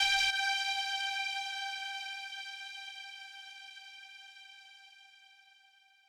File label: lead